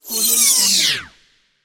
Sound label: ufo flyby whiz; urbazon